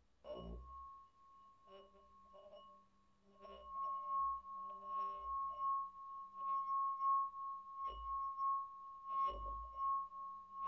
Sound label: Wine Glass; Trevor Webb